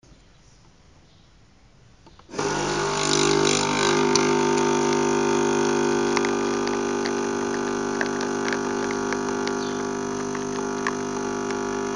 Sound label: espresso cup maschine